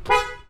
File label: horn; SuperTuxKart